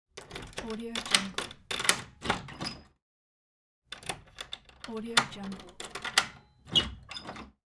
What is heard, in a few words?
door open